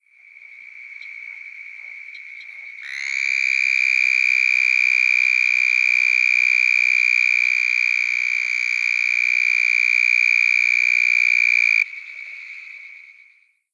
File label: Anayrus terrestris single